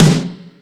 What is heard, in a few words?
All Snares, Drum Machines